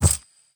highvalue pickup; ui; inventory

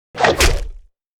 arc whoosh; weapons; dota; pudge

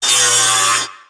tango cut; weapons; dota; shredder

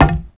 weapon drop wood

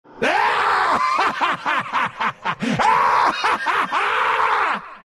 shadow demon laugh; dota

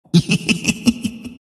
crown laugh; dota; monkey king

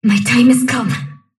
sylph move pain; dota; dark willow

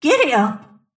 move pain; snapfire

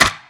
fish slam; npc; courier; drodo

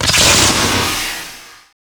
shock; dota; shadowshaman

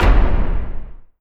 switch view, ui menu

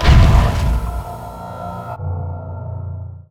trap spawn; templar assassin

